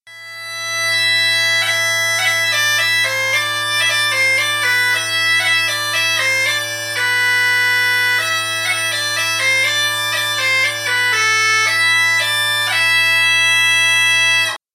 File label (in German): Grosser Marktsack A